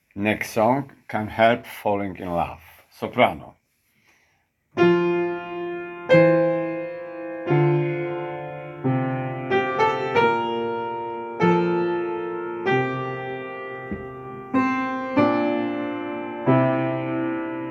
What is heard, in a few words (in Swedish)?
sopran